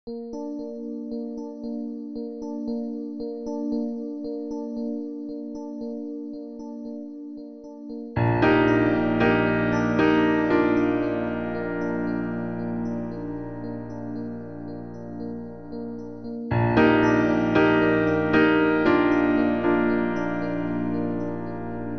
e and a piano

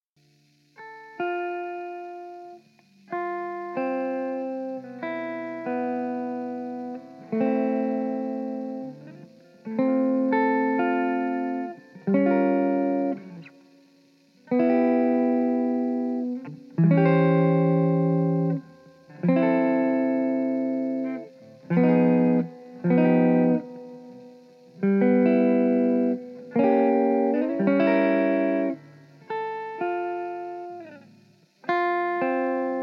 C 100 Jazz Chords GTR Solo

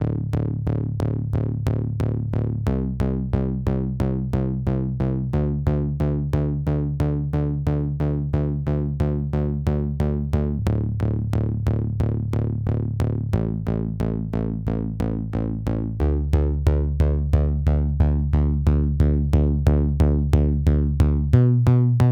Dm90 BASS PULSE

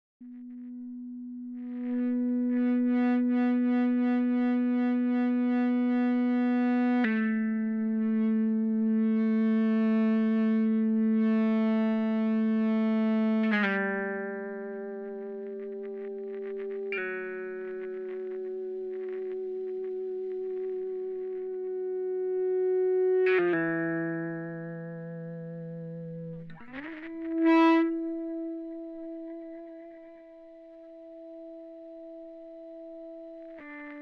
Em70 Ebow dry

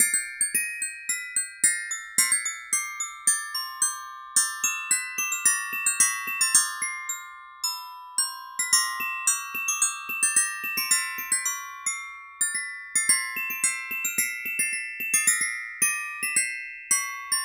glockenspiel 110bpm

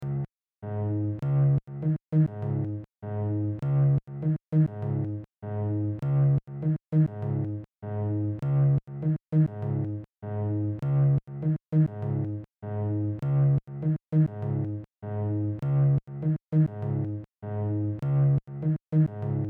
C#m100 acoustic

